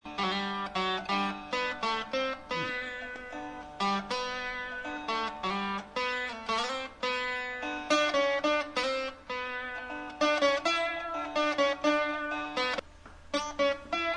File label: Behag; Kalamukha; Haralahalli